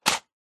household medicine tablet pack box set down; zapsplat

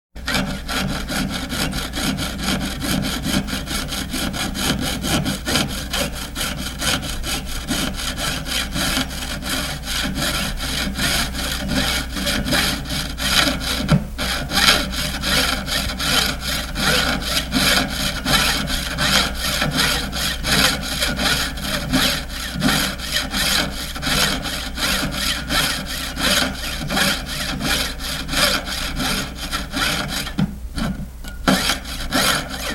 industrial filing metal; sound effects one

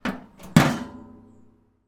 household bbq lid drum close

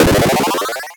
game one up extra life

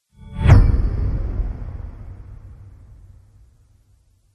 spaceship fly by; george gazetis

